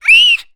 Child S Scream

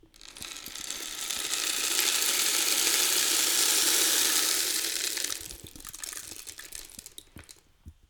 Rain Stick

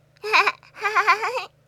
Cute Girl Laugh

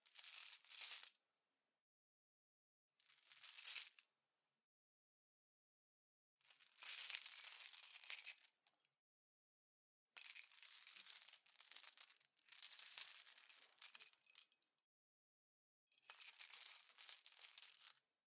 Chain Metal Rattle; Kvv Audio

